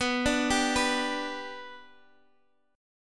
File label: B-Minor Sharp 5th, Guitar, Open D